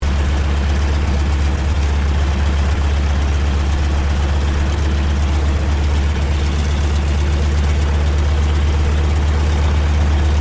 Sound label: AIRCRAFT BOEING PT-17 STEARMAN IDLE LOOP, Stearman Trainer; 1939; Airplanes (Sound Effects:Vehicles)